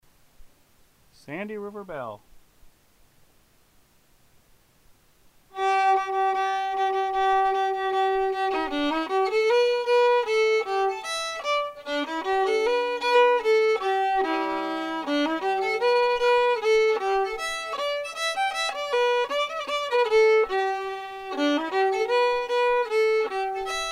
fiddle; festival tunes

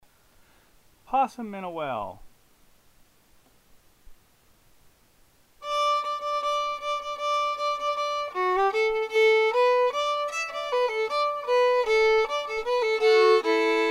fiddle; festival tunes

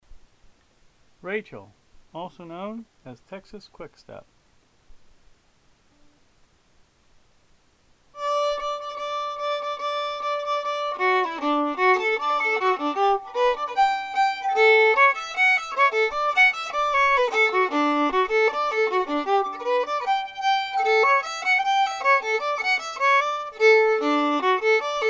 fiddle; festival tunes

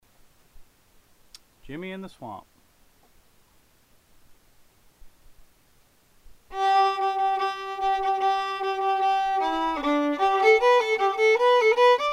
fiddle; festival tunes

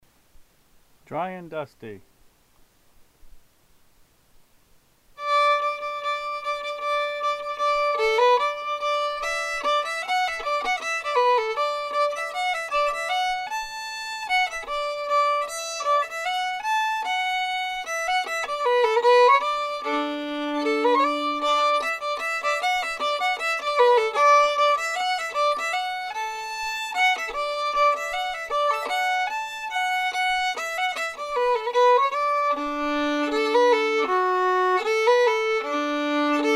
fiddle; festival tunes

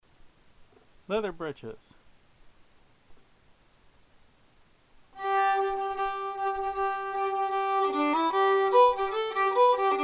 fiddle; festival tunes

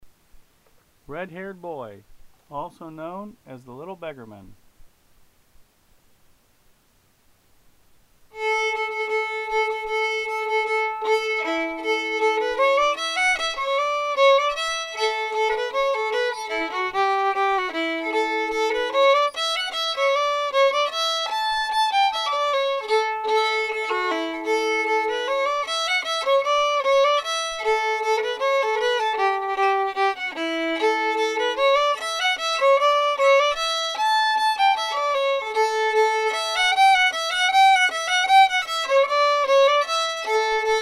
fiddle; festival tunes

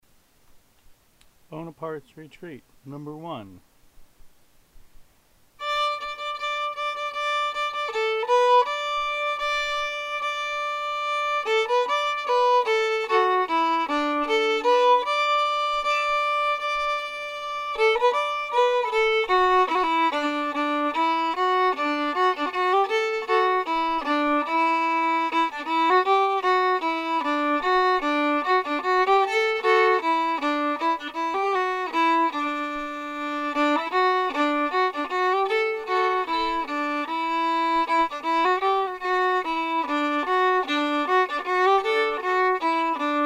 fiddle; festival tunes

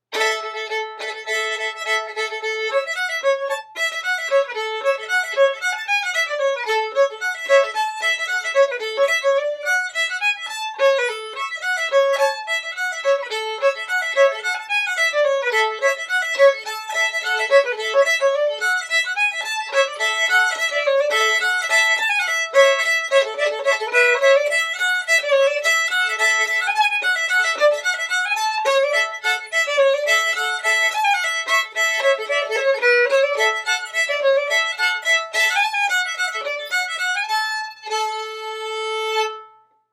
fiddle; festival tunes

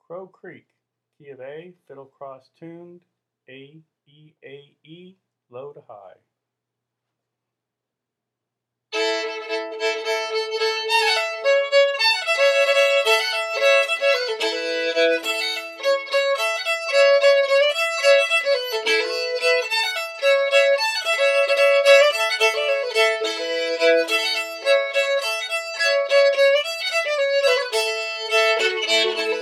fiddle; festival tunes

